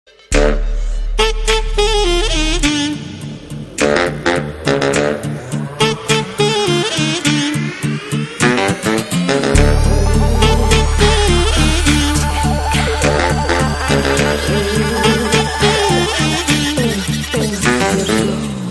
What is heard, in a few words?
Front Musik Tone; Spyder; Tamil